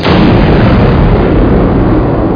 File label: EXPL; reflect